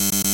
Buzzer Three Times, Alarms sirens; Barotrauma; EK modern

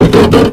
Structure Crunch; The Avali's Audio Overhaul

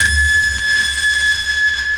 sonar Ping Water Medium Shuttle Directional; Barotrauma; Real Sonar

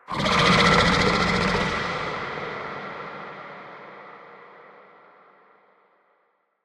shark; Barotraumatic; Paralyticshark

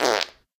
Fart; DynamicEuropa; Hungry Europans

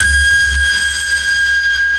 sonar Ping Water Medium Shuttle; Barotrauma; Barotraumatic; Carrier

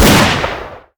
pipe rifle; Weapons; EK modern; Barotrauma

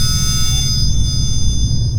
sonar Tail Water Close Beacon; Barotrauma; Real Sonar

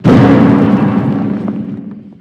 Ice Crash; Barotraumatic

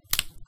bonebreak; Barotrauma; Neurotrauma

